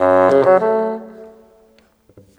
Bassoon, Orchestral, Rock Pop

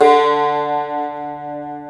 TAR D2; Proteus3

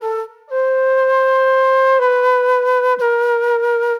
Soul Plate Flute

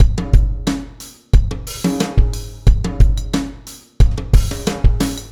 Beat, Drums, Eclectic Electronica

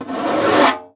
Conga; ProSamples vol.42 - Session Instruments

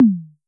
Toms, Elektron MachineDrum SPS1 MKII

